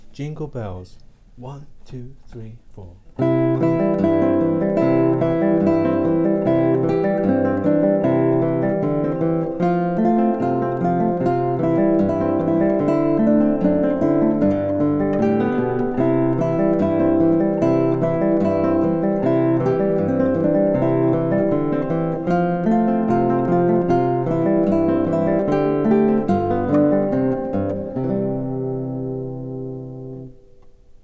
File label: Jingle Bells, guitar